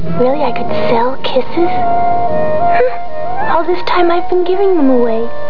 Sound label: kiss; BatB